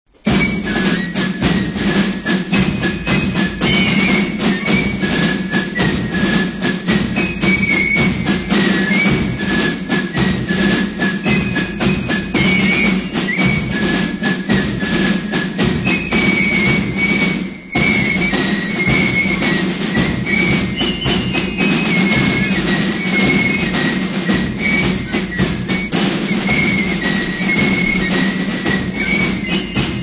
Revue Marsch

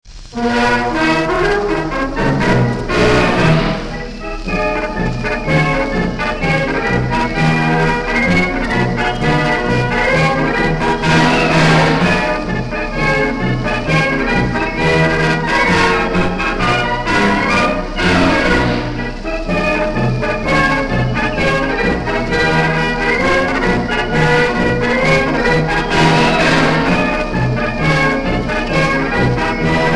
Frohsinn Marsch

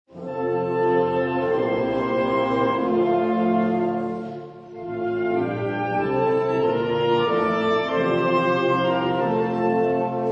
Marsch der Priester

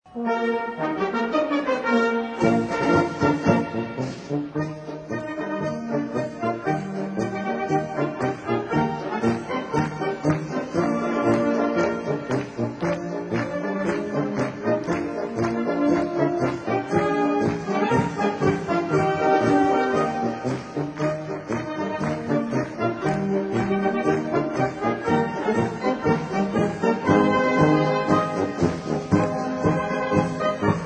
Maria Saal Marsch

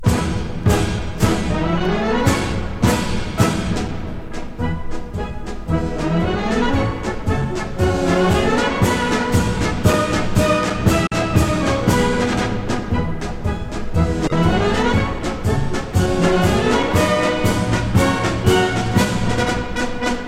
Alpenlieder Marsch

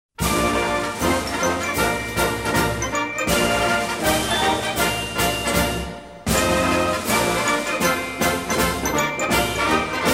Marsch des Infanterie-Regiments Jung Bornstedt

